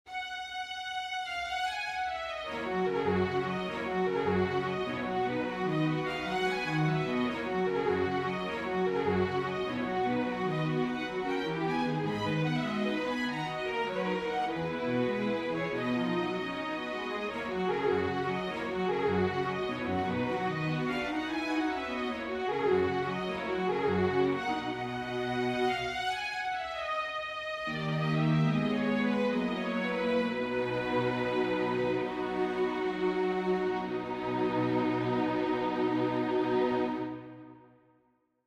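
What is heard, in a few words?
prelude major for string quartet; chopin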